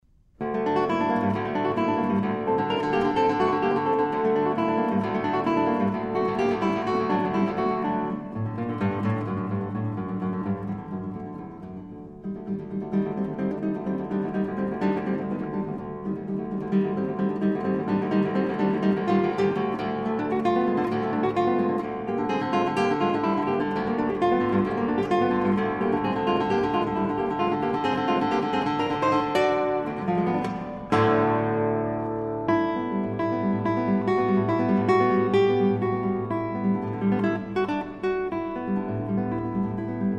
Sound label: Sonata in D major Vivo ed energico; Castelnuovo-Tedesco